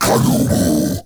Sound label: Cast Vocal; Warframe; Uriel; Demonium